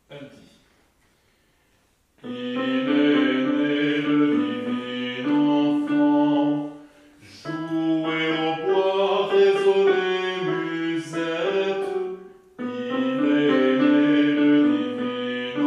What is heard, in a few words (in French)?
Il est né le divin enfant Alti